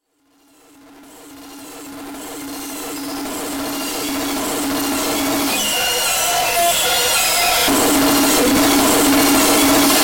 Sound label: ou hahahahahha